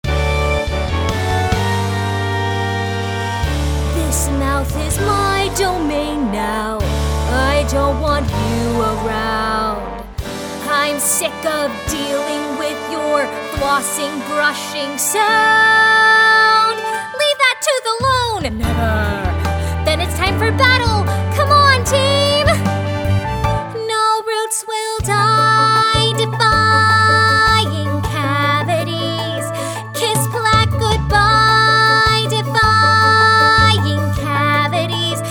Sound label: vocal